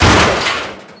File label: bump soft, car